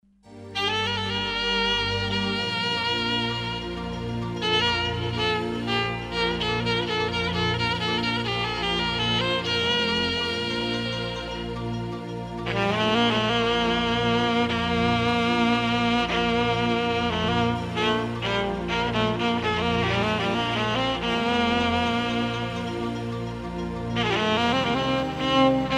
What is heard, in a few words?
Arab Melody